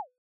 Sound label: dropdown close